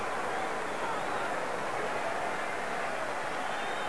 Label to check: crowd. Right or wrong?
Right